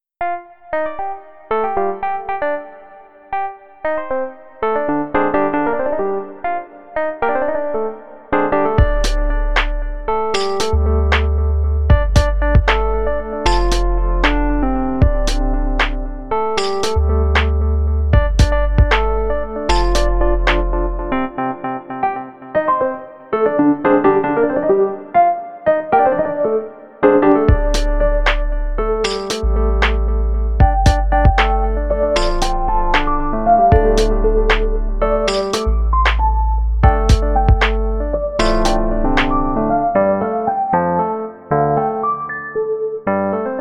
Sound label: jazz